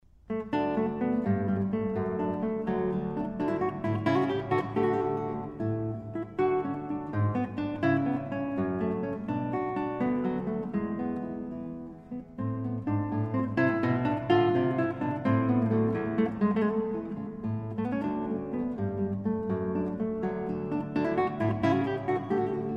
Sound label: Cello Suite No1 Gigue; Bach